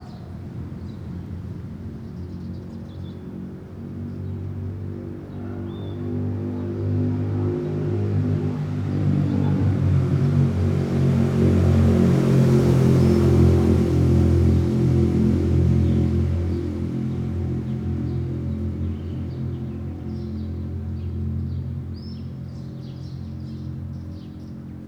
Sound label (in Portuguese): Carro diferente passando som mais tonal e profundo com passaros; maquinas; canais-stereo